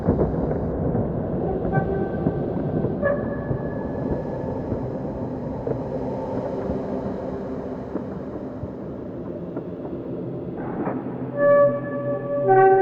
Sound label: Amb Dungeon Wind